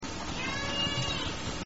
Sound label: moo, transient killer whales; salish sea